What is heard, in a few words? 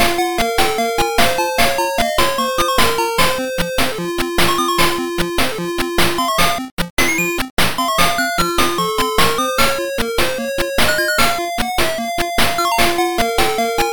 ruins psg; aztec adventure